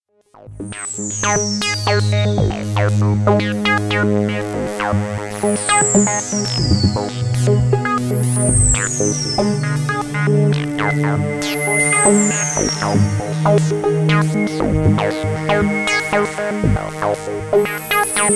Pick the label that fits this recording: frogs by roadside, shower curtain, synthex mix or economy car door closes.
synthex mix